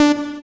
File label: SYN POLY